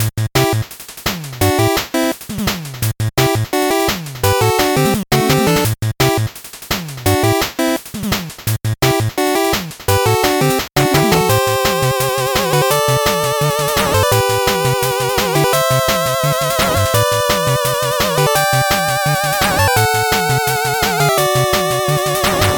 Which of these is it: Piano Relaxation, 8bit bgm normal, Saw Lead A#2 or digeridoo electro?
8bit bgm normal